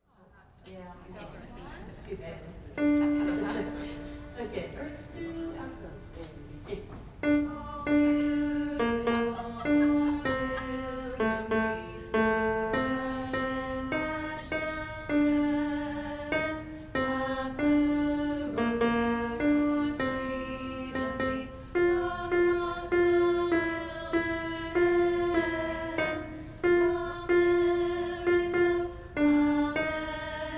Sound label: alto; Gather Hymnal